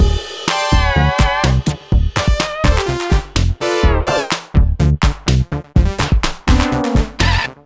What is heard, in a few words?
Party Time Music Funkasmic C Loop